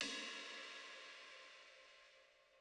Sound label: Drum Loop, 101 BPM Cymbal; Loop Stems; Cymatics; DOPE DRUMS VOL. 1; Red Room